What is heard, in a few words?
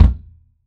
Acoust 56cm, Drums; Polyend; Simulacrum Sounds; 1 Shots; Acoustic and Electric